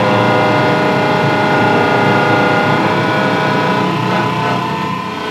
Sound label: turret; World of Tanks